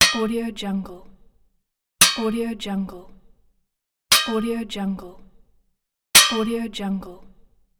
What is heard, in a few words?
sword